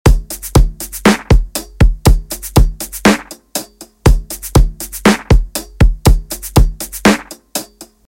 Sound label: 120 DRUM LOOP HOT WITH EXTRAS